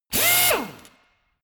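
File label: impactor long high, drill; maschinen; bohrer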